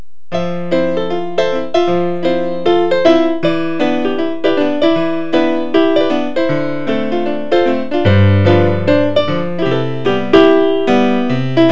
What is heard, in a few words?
music